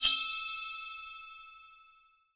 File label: multistep; pyramid